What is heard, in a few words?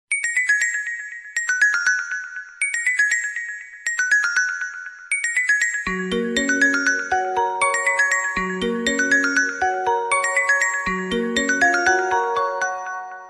xperia sony ericsson ringtone